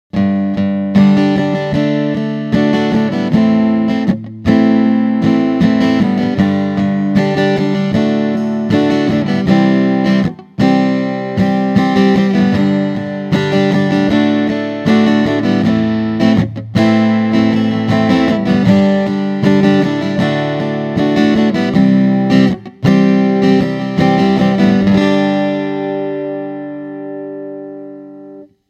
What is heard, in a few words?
strum roland